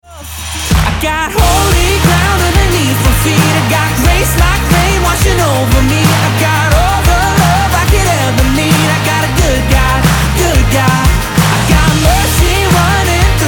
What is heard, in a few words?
Chorus Hook